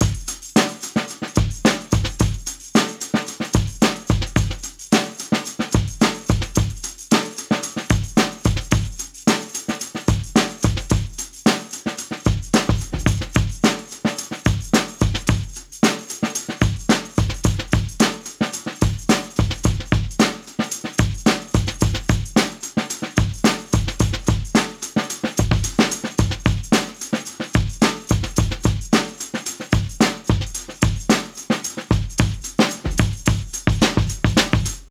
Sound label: Breaks 110BPM